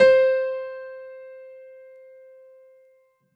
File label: Piano C4